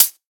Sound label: hihat